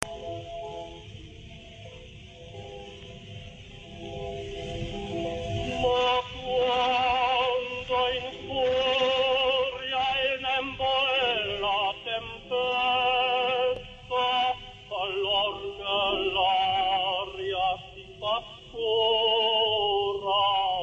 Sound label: ukrainian tenor